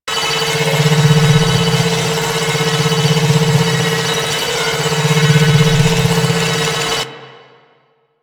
Fortress Data Cable Loop

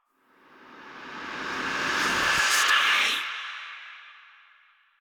Cave Whispers; Ambience; Orokin; Warframe; Stalker Cave

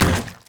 Zombie Run Metal, Footsteps; Warframe; Infested

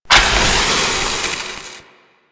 Proto Infested Maggot Spark Start

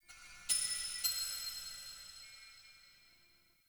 Cave Mysterious Distant, ambience; Midrath Island; Shrine Cave

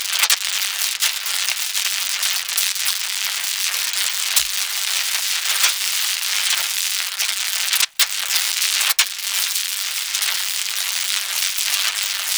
Kahl Quest Veil Spark Loop; Ambience; Warframe; Veilbreaker

